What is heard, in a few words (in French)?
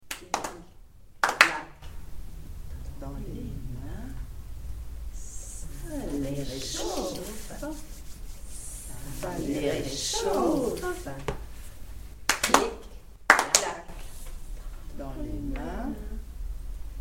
clic clac dans les mains